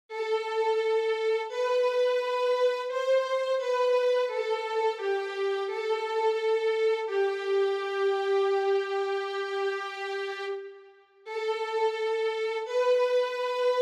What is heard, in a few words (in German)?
Slow Fox Violine